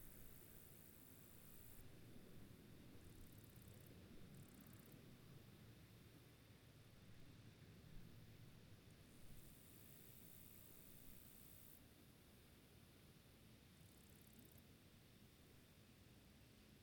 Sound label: ambient grasshoppers near water